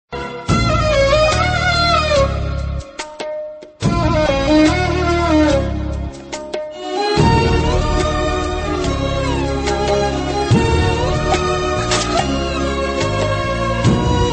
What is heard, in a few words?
theme bgm background music instrumental music sad bgm music; yamadonga